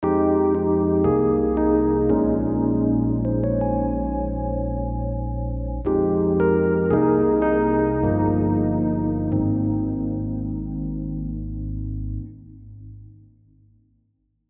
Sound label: rhodes dreamy